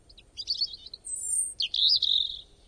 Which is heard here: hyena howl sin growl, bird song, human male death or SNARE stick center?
bird song